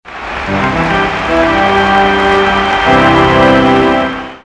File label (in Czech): Fanfara varhany; NHL